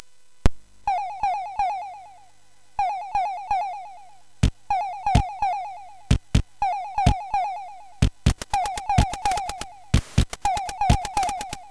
Disco, MOS6581R4

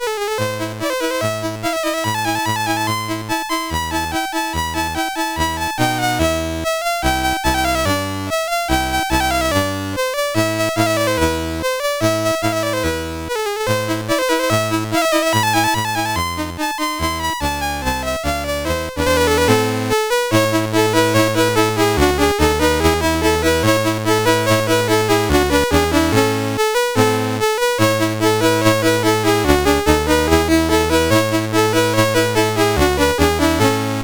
Rondo, CSG8580R5; Master Composer; Turkish

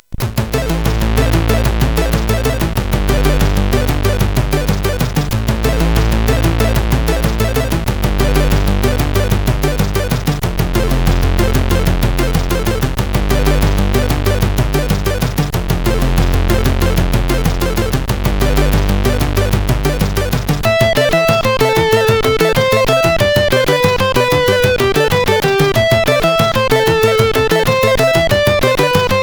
Combat Zone, sid CSG8580R5

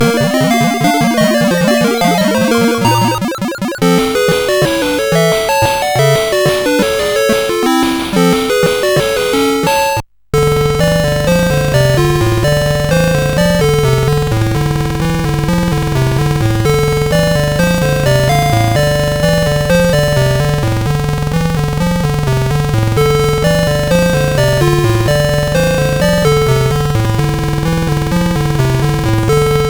Musicbox, CSG8580R5

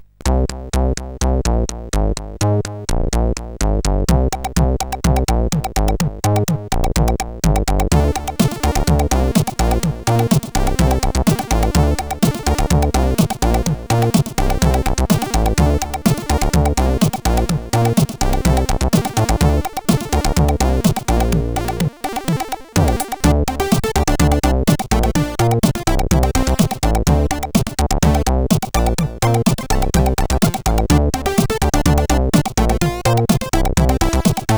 Covox, CSG8580R5

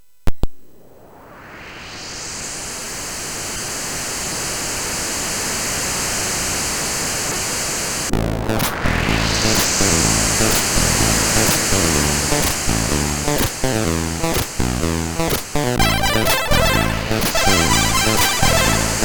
Wind, MOS6581R3

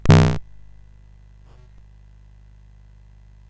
CSG8580R5, GAMES; Street Rod

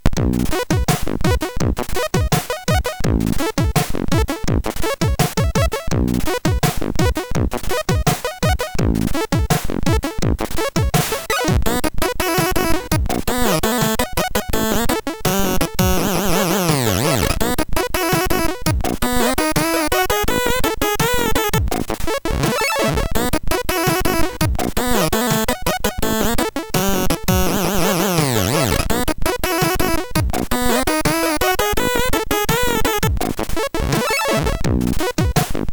Score, MOS6581

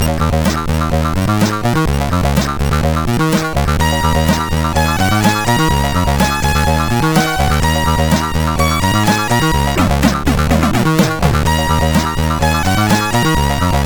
sid CSG8580R5; HVSC

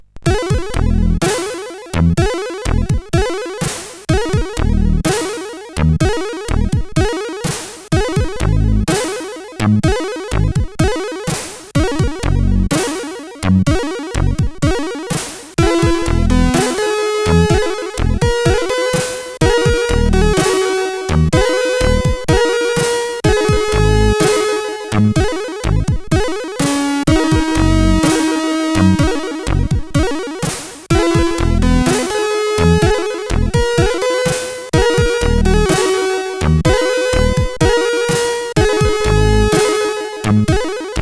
Classical, CSG8580; CSG8580R5

